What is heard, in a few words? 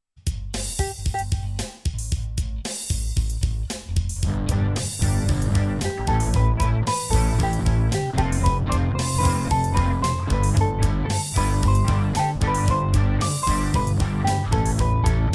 Elevator Music